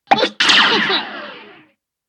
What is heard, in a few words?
flying bird small bird shoot